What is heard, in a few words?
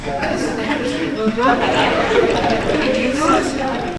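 community chatter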